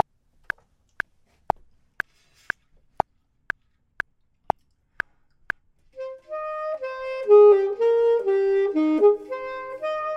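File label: top, Saxophone and Clarinet; voxman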